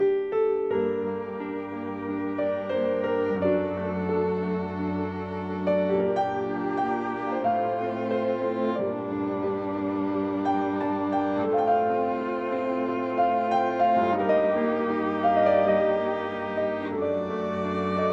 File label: Instrumental